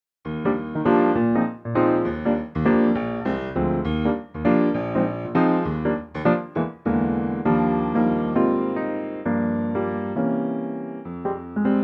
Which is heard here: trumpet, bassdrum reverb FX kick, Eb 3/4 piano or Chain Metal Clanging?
Eb 3/4 piano